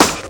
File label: SD, Dance Mega Drums; Best Service; SD-HIP